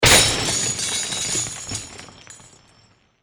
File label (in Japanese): 破壊音 たくさん破壊